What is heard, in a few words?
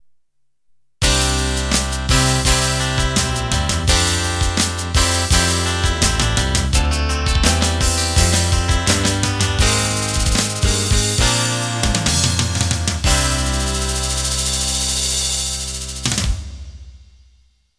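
Rock Ballad End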